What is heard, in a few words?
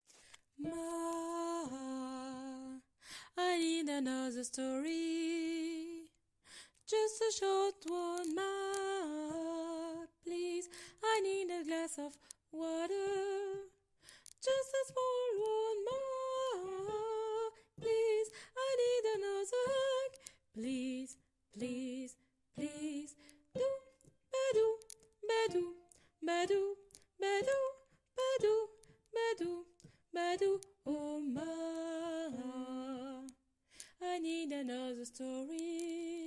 canon